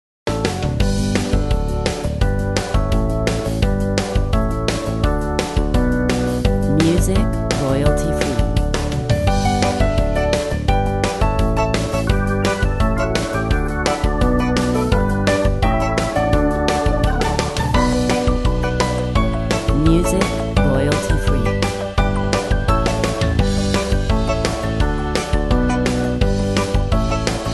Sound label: Upbeat Corporate